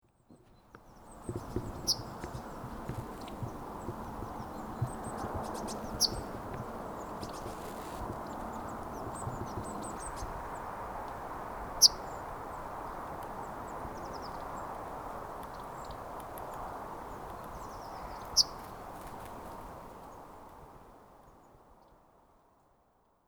keltasirkun tsih; linnut

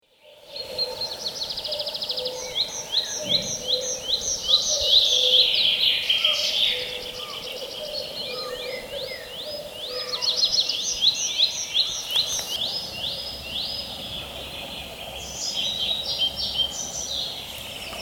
metsätrio; linnut

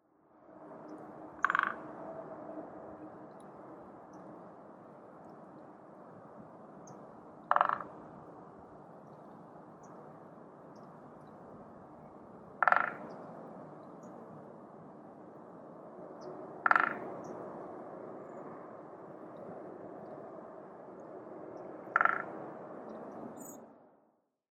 rummutus, linnut

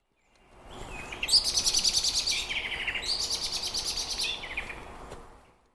rastaan raksutus; linnut

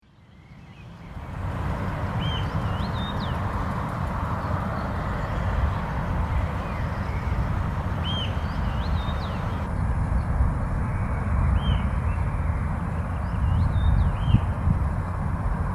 meriharakka; linnut

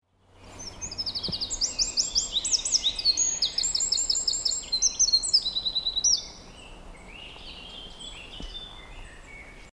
peukaloinen; linnut

